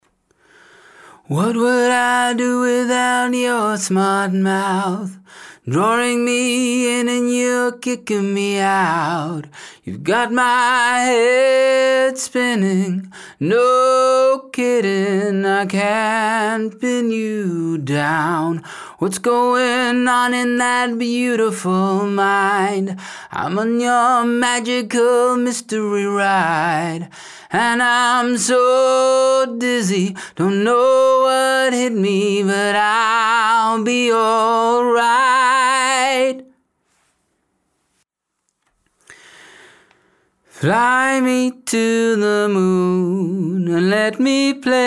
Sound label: vocal singing sample